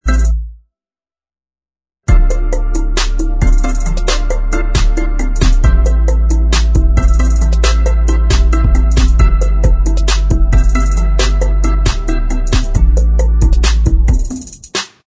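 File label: instrumental, backing track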